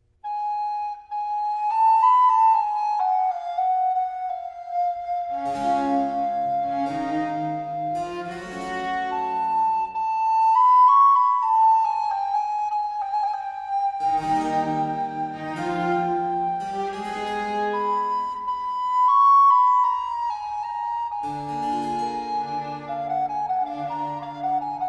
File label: Largo Concerto in C Major for Recorder and Strings; Antonio Vivaldi; RV 433